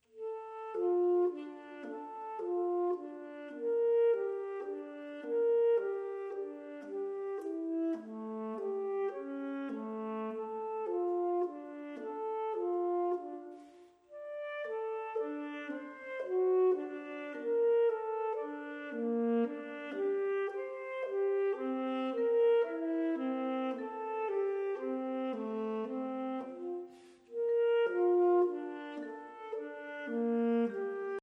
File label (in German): Brass aux Saxes Plays Monologue, blasorchester